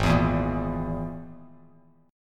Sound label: Ab Suspended 2nd Sharp 5th, Bass Standard; strummed; 4,7,2,3; JGuitar